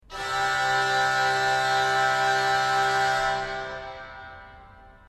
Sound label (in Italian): tromboncini 8 C; izola